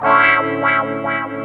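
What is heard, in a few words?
wah-wah guitar; E-MU Formula 4000 Series Vol. 5; Protozoa; Phatt Presets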